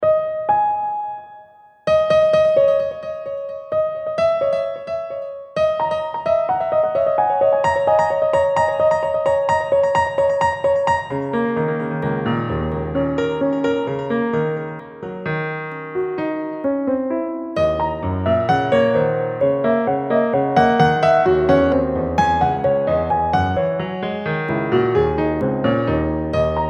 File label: 130 BPM